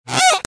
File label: BIRDS